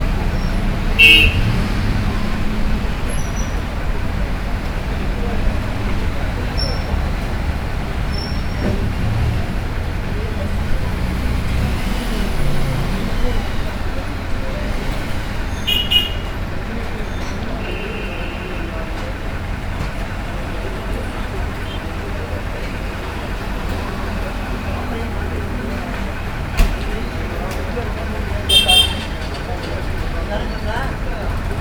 port blair, aufm balkon, stereo mix; Indien; ZOOM

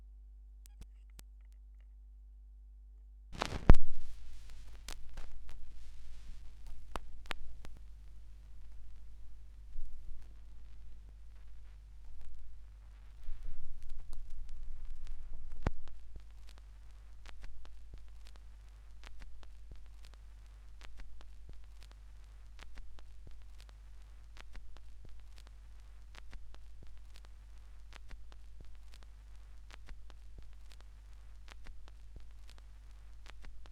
schallplattengeraeusche

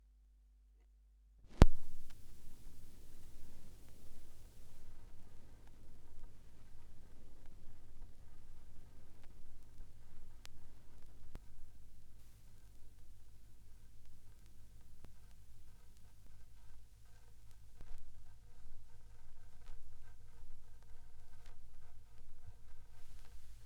schallplattengeraeusche

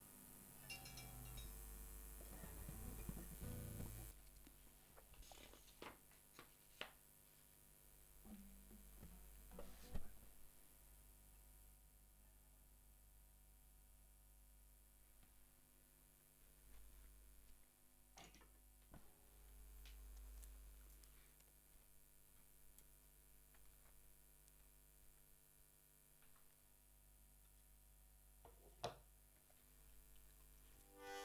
Nebengeraeusche

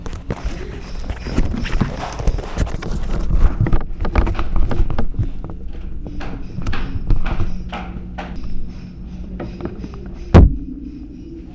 Hall Saiteninstrument, Brasil; O-Ton

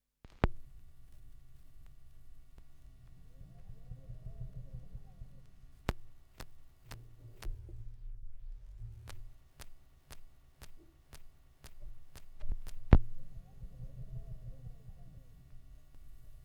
schallplattengeraeusche, Vinyl; wendelrekords 02

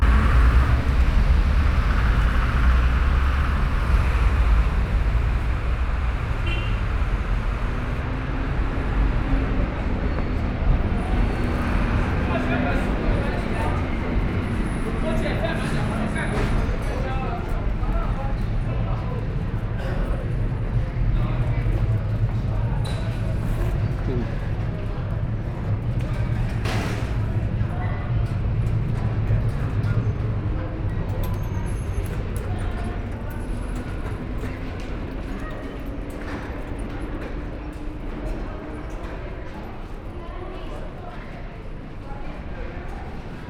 London Strasse; London 3 (Walkman Performance)

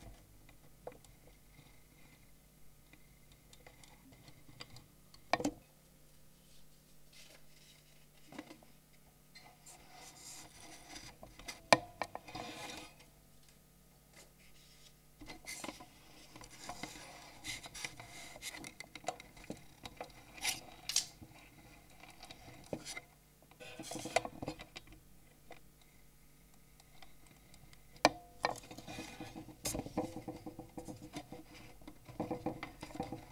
Komposition fuer Spieluhr (Nebengeraeusche)

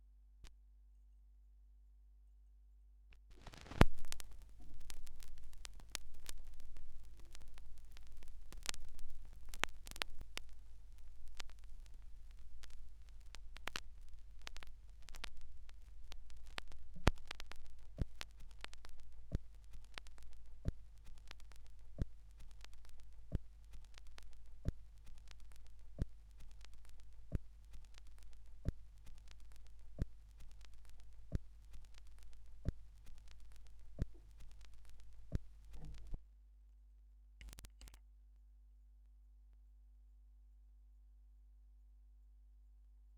schallplattengeraeusche

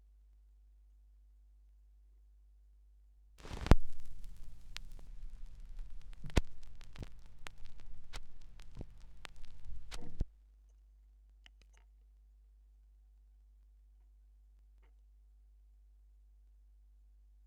schallplattengeraeusche

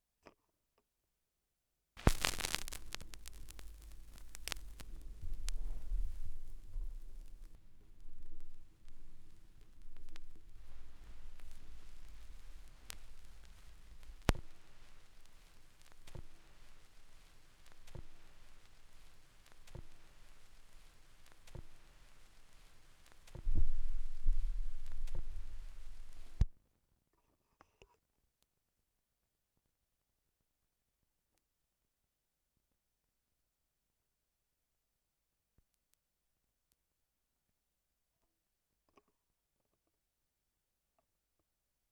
schallplattengeraeusche

